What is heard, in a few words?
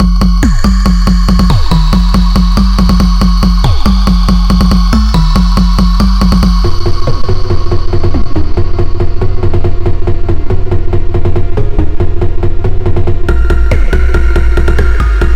Phonk